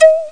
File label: 707 Pizz Chiff